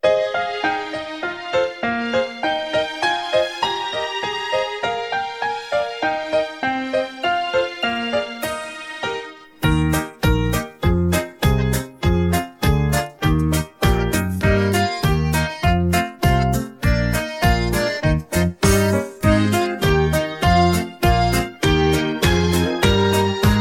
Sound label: instrumental